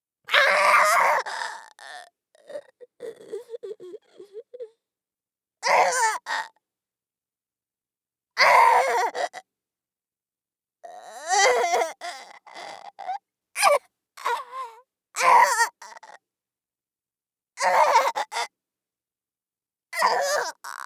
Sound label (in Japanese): 皮剥ぎ鞭; 効果音なし